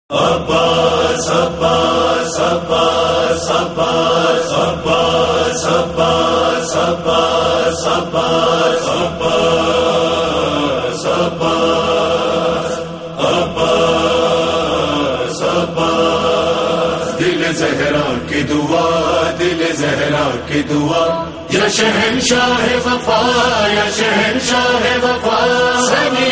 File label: Noha